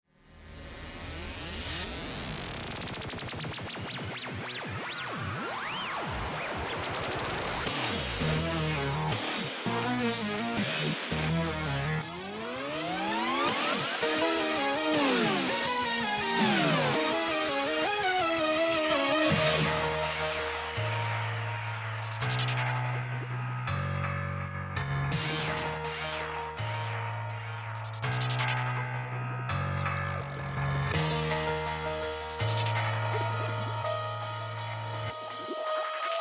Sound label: Synths Only; Metal Gear Rising